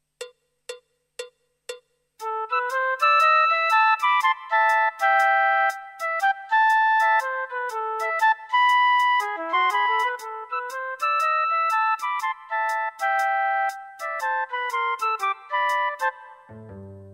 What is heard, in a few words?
Two Flutes